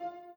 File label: piano